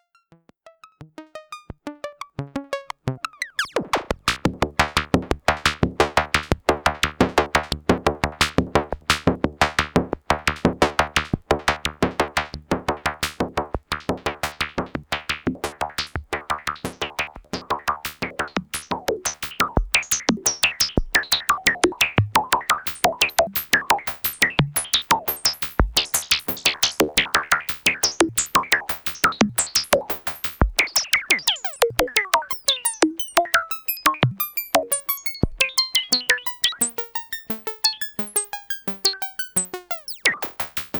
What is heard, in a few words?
Jupiter 4 high frequ LFO